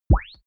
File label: bleeping kick